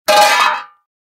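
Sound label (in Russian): звук пустой металлической тарелки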